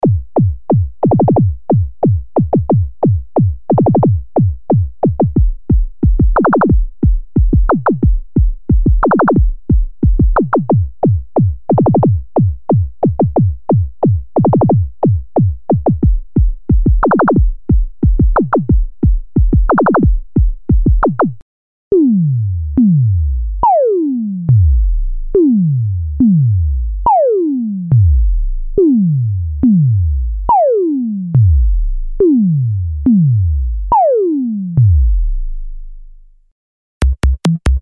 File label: Analog Kicks, Waldorf Pulse; PULSation